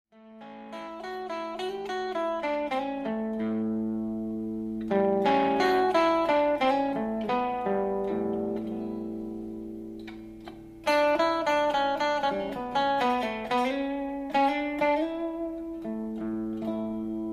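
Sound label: clean, blues guitar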